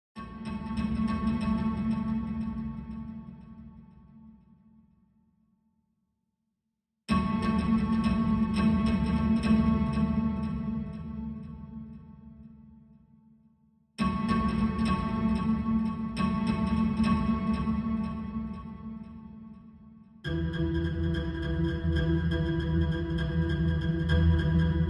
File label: No Lead Viola